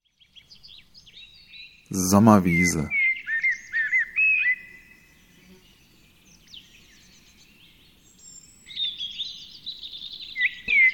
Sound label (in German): Sommerwiese, Natur